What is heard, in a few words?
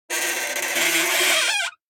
Door - Wood Creak Sharp; Hollywood Edge; sound designer's toolkit v2